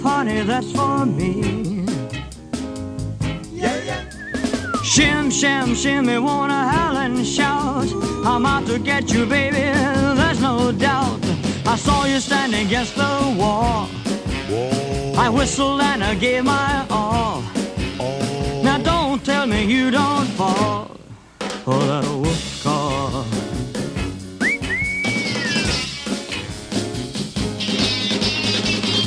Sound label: wolf call